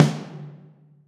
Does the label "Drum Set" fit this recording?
Yes